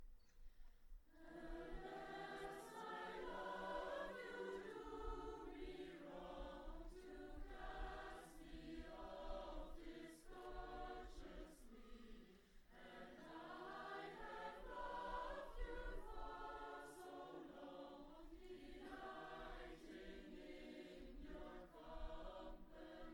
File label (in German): Chor und Jubilaeumschor; Greensleaves; Traditional Scotland